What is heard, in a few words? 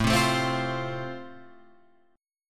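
A Minor Sharp 5th, Guitar Open F, chord strum; Am#5 strummed; JGuitar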